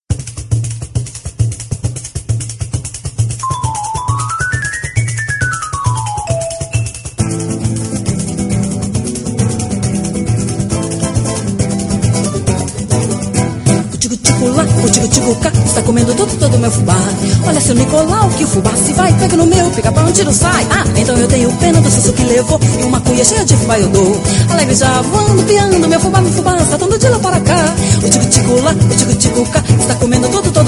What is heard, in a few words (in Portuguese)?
Samba, Pagode